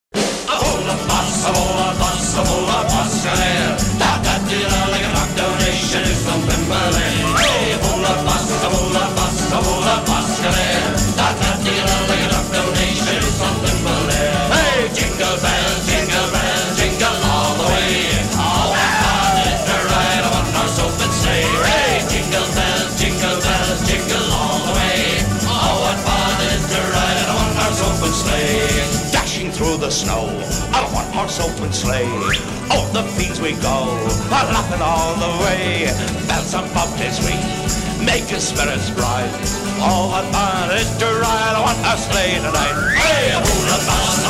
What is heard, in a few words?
jingle bells